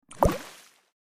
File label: Water Drop; my cute commissar 2020 gamerip